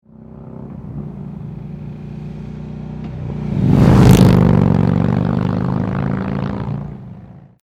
FLY BY, Twin Slash 2-Into-1 Slip-On Muffler For Harley Dyna; Vance & Hines 4" Twin Slash 2-Into-1 Slip-On Muffler For Harley Dyna 2012-2017; Exhaust Sound - Fly By